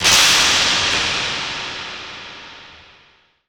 snap, percussive

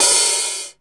DDD1 HH OPN; Back In Time Records; Korg Universe VOL-1; DRUM FAMILY

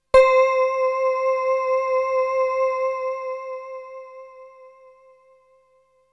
Melody oneshot; ZERO G - Datafile DISC 2

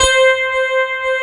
FM ELP 3 C4 L, Keyboards Old School; USB Soundscan vol.09; AKAI

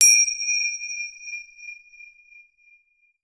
SPINNIN, BELLS CHIMES; Voice Crystal - Steve Reid Definitive Percussion

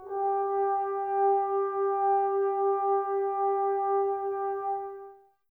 Brass, Woodwind Brass Ensemble; Miroslav Vitous - Woodwind Brass Ensemble; Tbs

